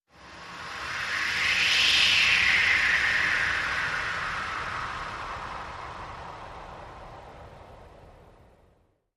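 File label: Whoosh Gusty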